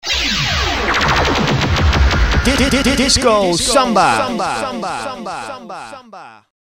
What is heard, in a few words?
samba